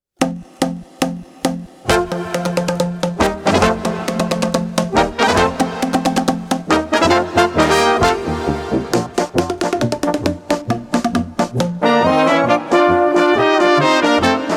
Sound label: boomwhacker